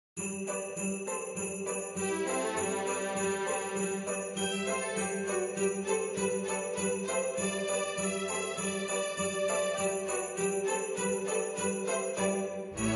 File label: String Orchestra